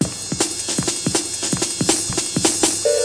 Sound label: frenzi filter, drum loops and breaks, 161 bpm; 161 to 180 bpm